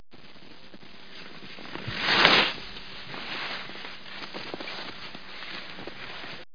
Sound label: ski stop